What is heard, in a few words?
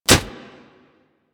Melee Fist Impact Generic; Warframe; Weapons; Fists